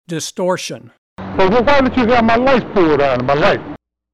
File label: distortion